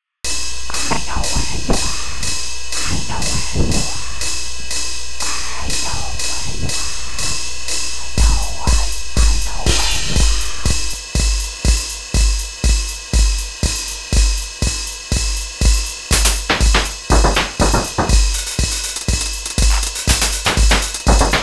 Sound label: White Noise